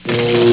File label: electric